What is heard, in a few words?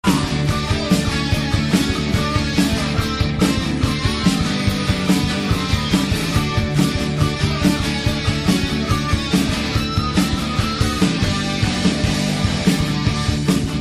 Classic Guitar Riff